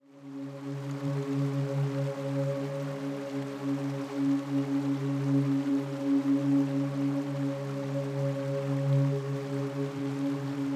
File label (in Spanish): Musica Relajante Para Meditar